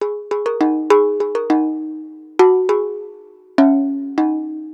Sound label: Jug Hi Melody; Ambient; Melodic; Ambient-Melodics